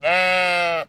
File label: Sheep, sheep bleat outdoors; SyrinScape; Waterdeep